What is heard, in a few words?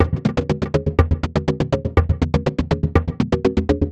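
122 BPM Sequence A major F minor Tonic E; innovative sequences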